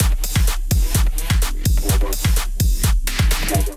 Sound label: mega vox kit groove 127 bpm